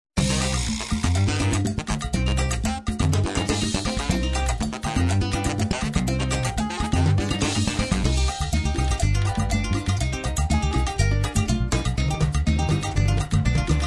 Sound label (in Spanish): promos cumbia; Telefutura